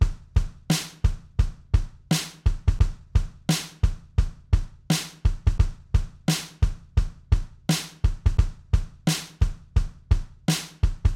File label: Swinging Bass Drum In Fourth Measure